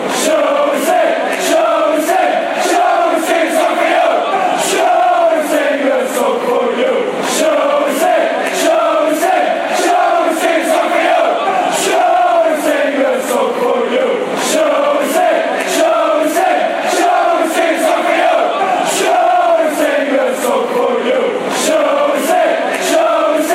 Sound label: shall we sing